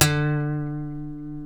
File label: E, funk guitars